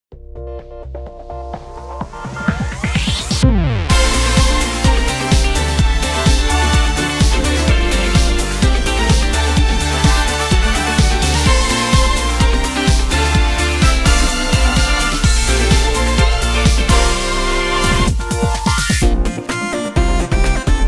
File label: BGM Battle; aster tatariqus android ios mobile gamerip 2023; BGM Battle Gawain